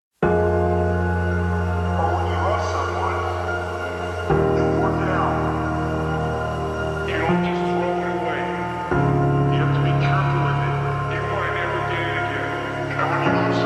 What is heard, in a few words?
Slowed Reverb